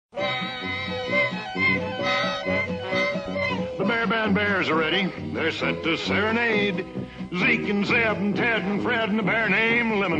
Bear Band Seranade; Mineral King

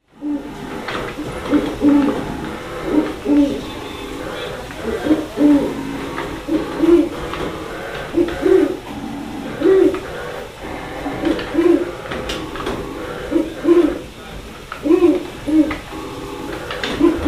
Mill Sounds; Harpers Mill

